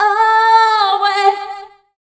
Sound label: Dance Vocals, FEMALE